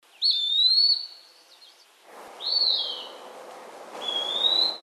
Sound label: PEWEE EASTERN WOOD; species/birds; wbba